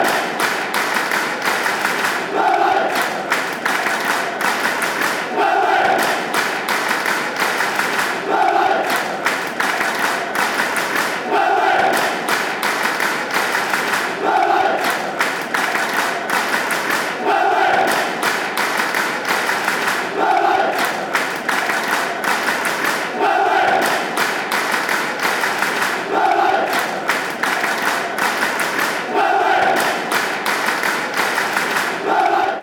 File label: claps, fanchants; burnley